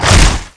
charger punch; umbrella

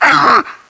charger pain; umbrella

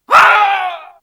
witch pain; cstrike; zombie plague

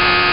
missile warn

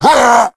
pain tank; umbrella

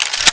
ak47 draw

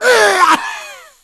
pain smoker; umbrella

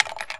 shell; weapons

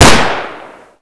glock

